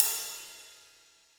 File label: drum crash sample g sharp key; tuned cymbal